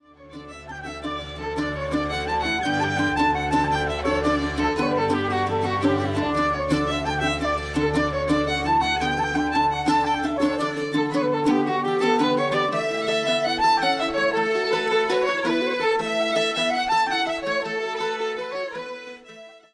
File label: Jigs